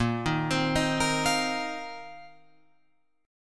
A sharp Suspended 2nd Suspended 4th A sharp, Guitar, Open F; A#sus2sus4 arpeggiated; 10,10,12,10,13,12